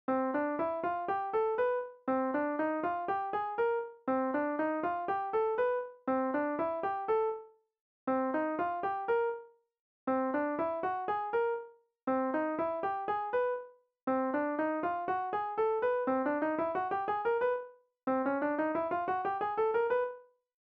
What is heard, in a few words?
scales; shosys academy; conventions of scale labeling